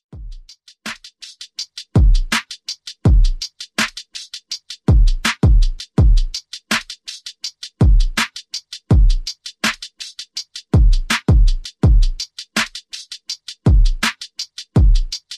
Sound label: Top40 Redrum 82 Bpm